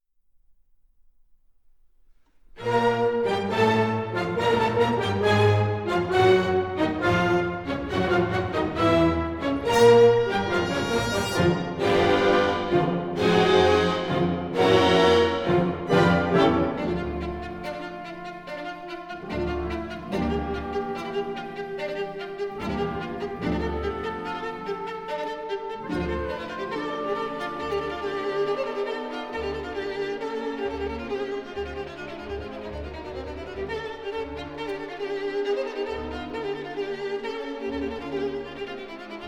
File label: Violin Concerto Allegro; Khachaturian